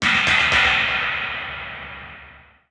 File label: Music Stadium Event